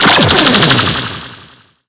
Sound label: Player Out; BOMBERGIRL RAINBOW; Arcade